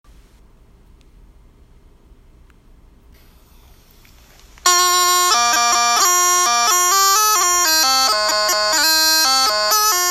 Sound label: pipers